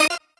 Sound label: normal hit normal